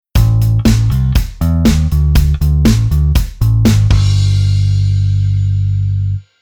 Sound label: Bass